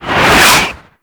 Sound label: Tank Cannon Projectile Whiz; Enemies; Weapons; Lasrian Tank; Warframe